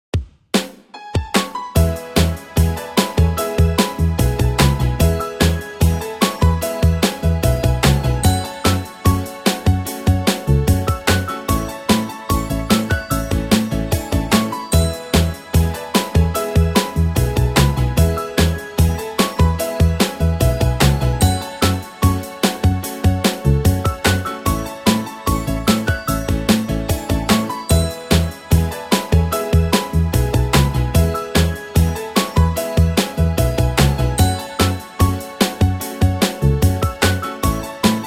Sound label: soundtrack